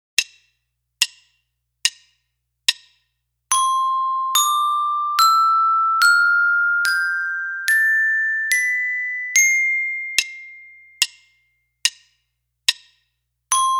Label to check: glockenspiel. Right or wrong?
Right